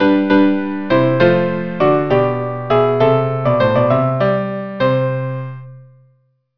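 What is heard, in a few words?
violin cello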